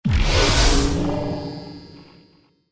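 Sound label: Whoosh Teleport Enter Synth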